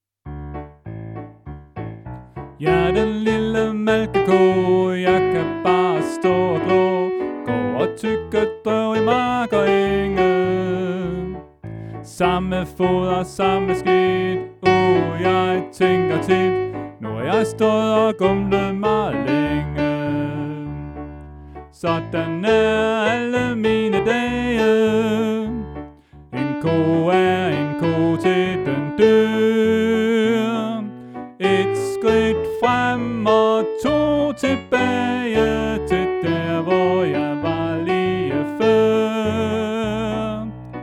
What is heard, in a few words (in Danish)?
Sopran